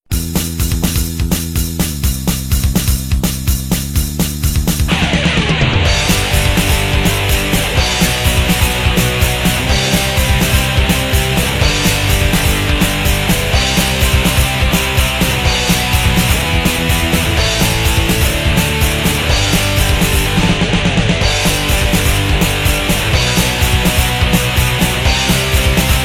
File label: Music; BeatEmUp GameTemplate3D; unity project